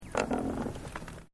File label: Wood Creaking; Material components for constructing more complex sounds; Materials